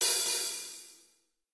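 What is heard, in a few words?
drums hi-hat double; smite; raijin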